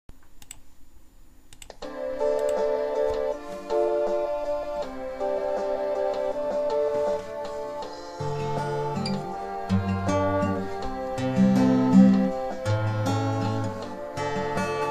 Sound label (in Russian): shema perebora